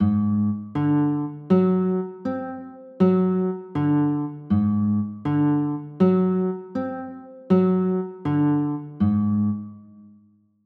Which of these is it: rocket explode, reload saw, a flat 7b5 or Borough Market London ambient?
a flat 7b5